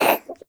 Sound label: Kitten Cough Hairball; Warframe; Catbrow